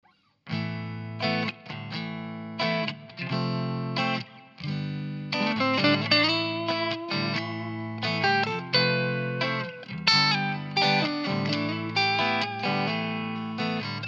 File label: Clean, gitar